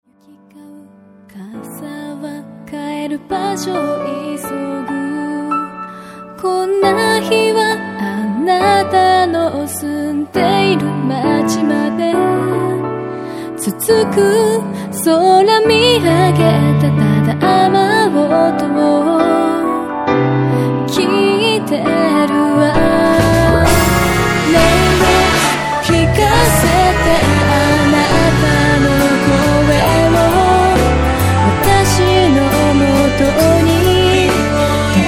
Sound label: raindrops; puretone